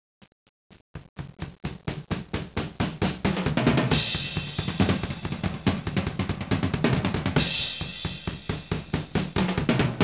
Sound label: drummer